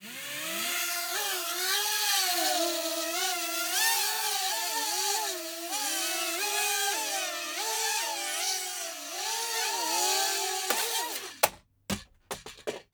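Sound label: Quadcopter Take Off Fly Around Crash Interior; Quadcopter Flight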